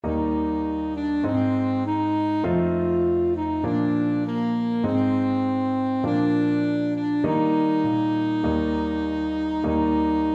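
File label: alto saxophone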